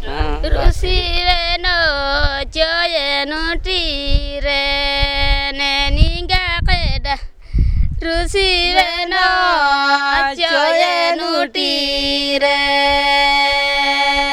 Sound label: Paharia song, malto; songs recorded in Pakur, 10 Feb, 2005